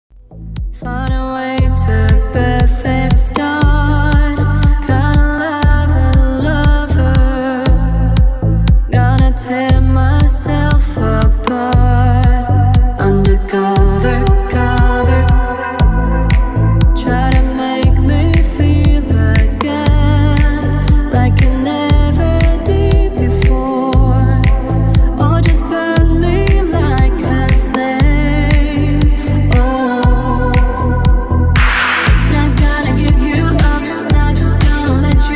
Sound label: remix